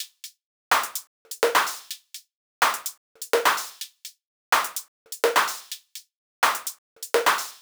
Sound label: 126, top loop; reakt